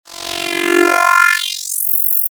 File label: distorted sweep